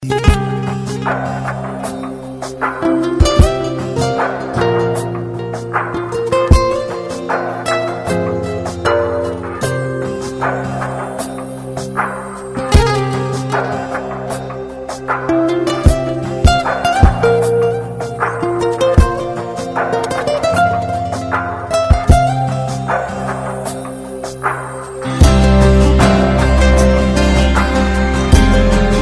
classical guitar

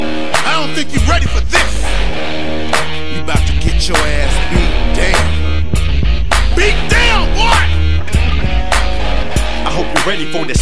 beat down

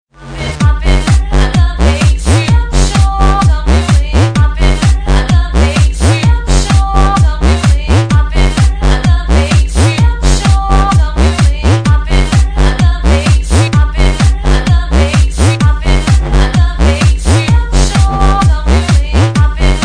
whoop whoop

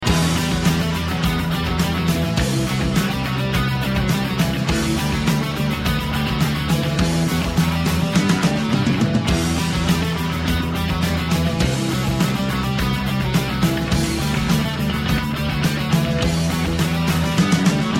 heavy metal

